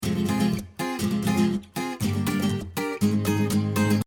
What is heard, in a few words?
AC Guitar Mix 120